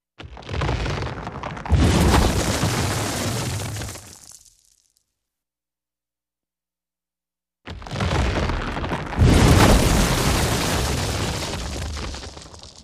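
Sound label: Explosive Earth Rock, earthquakes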